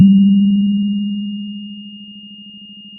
pop, Sound of a church bell

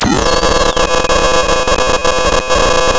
Sound of tearing paper, center

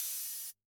Op Hat, drum

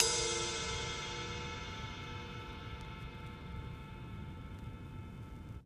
Vinyl Ride

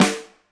Flam

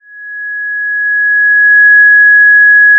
Sound of a police car siren

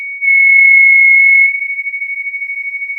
pop, sound of a tea kettle whistling

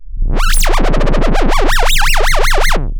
pop, chainsaw

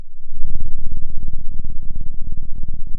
pop

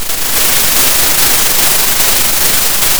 Sound of a thunderstorm

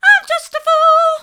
mid, vocal

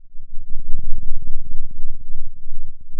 pop, Sound of a thunderstorm

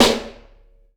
Piccolo E, drum

